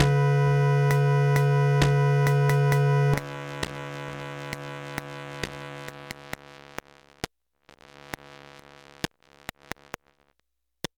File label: keys glitch filter